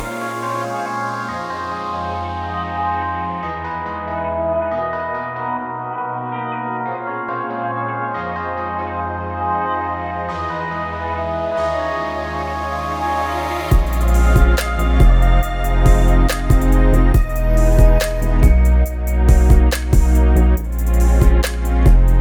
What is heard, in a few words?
music background looping